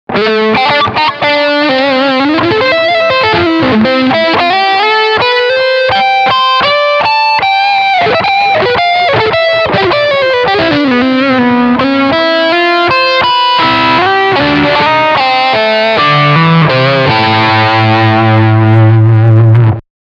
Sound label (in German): Lick Distortion beide PUs